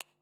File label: message sent